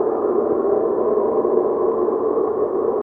SFX loop; Disney's Dinosaur

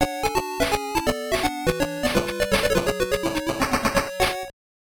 Jingle; J.League Soccer Dream Eleven; Game Gear

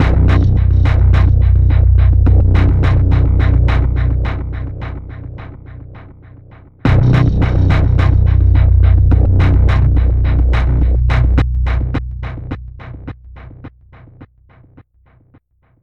Mission Music Stinger Intro; starcraft 2 wings of liberty

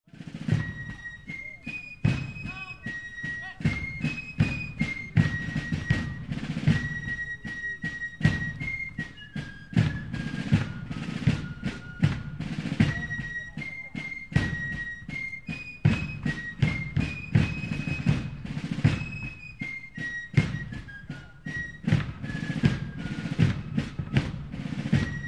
Fife

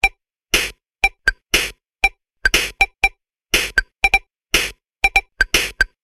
Slingshot Rhythm Core; The Lab; valve

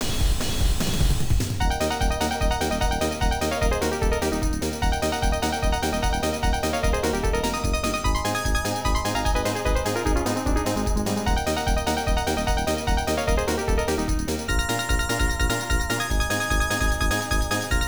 Continue GAME OVER; Wild West C.O.W.-Boys of Moo Mesa; arcade

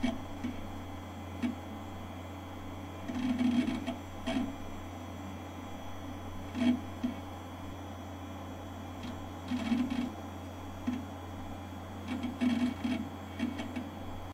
Running Computer

